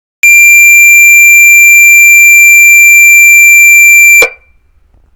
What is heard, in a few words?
continuo, sir